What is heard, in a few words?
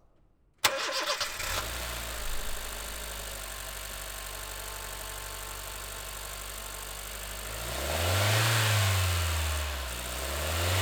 honda fit